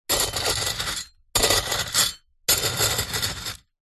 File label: metal scrapes and digs into small stones; foley